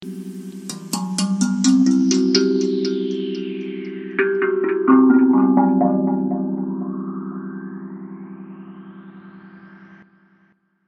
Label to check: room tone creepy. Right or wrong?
Wrong